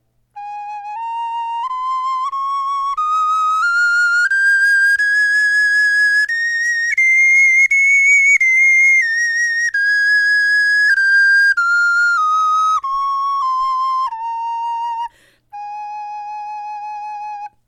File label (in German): tonleiter, knochenfloeten